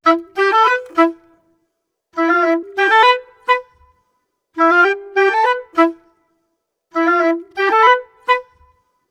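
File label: 95 A minor woodwinds, Orchestral arrangements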